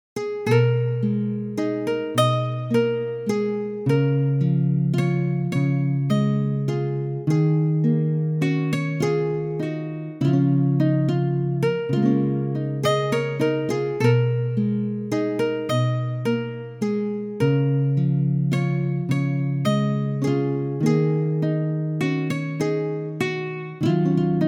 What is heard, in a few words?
radio bg